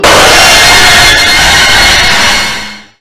jumpscare; fun times at homer 2

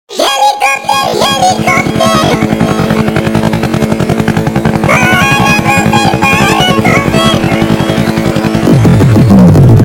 helicopter helicopter weird

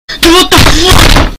fail death sound; for gd

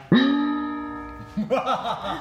evil laugh; ping

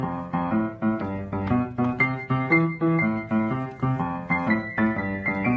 piano dance

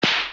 tree branch snaps

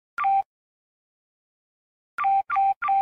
nokia low battery sound

